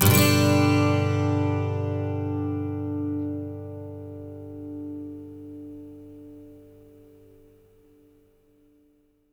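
12 string major G, Electric & Acoustic Guitar Loops; USB Soundscan vol.04; AKAI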